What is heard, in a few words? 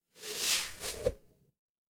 magic scifi rocket